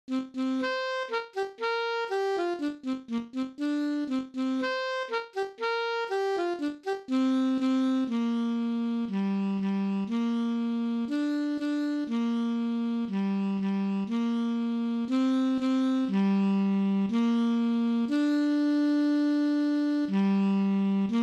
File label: alto sax celtic air and dance